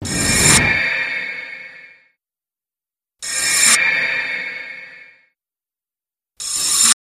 Flashback Entry Com, whooshes & swishes

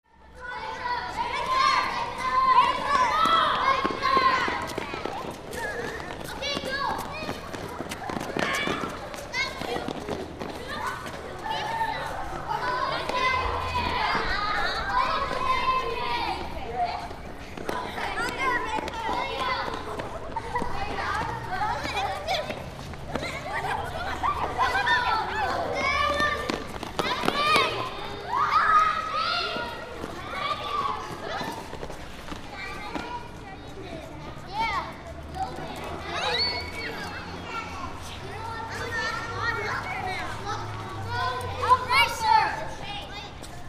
School Playground Am

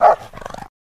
Rottweiler Vicious

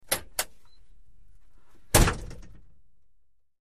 Humvee Door Opens; door, truck

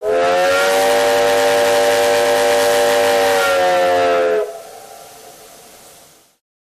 Steam Train Whistle, horns

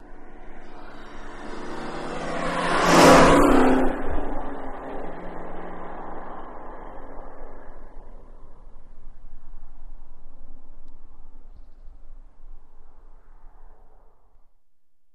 Mustang Fast Accelerating By Highway, Long Tail

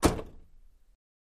Car Door Close

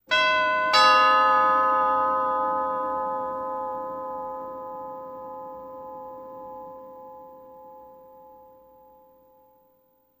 Doorbell Chimes Ding Hard Dong

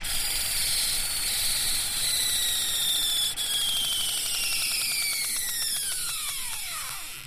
Machine Film Synchr, variable speed

